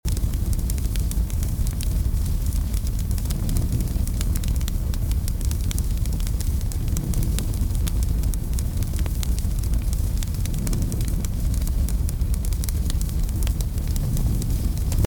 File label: Ambient Fire